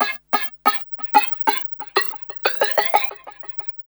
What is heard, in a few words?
RB 92 FX LP -R, Hip Hop R_n B; USB Soundscan vol.19; AKAI